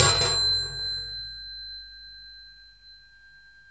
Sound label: BANG PING, METAL PERC; Zero-G Ambient 1